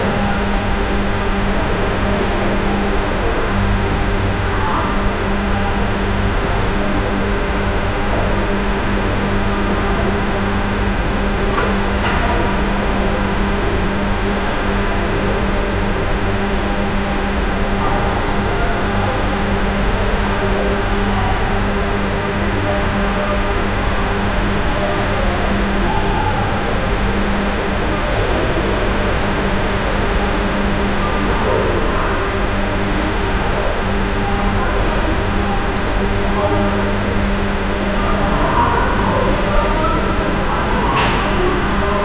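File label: fire station room ambience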